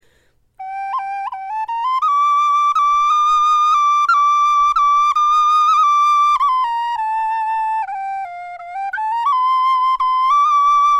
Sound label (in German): melodie, knochenfloeten